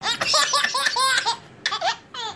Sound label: Baby laugh 4 months